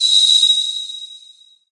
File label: Ice